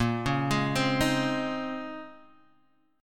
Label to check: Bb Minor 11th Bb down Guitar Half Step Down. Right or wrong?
Right